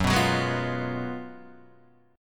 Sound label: F sharp Major 7th Suspended 2nd Suspended 4th, Guitar, Open D